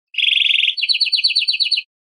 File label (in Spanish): acanariados, verderon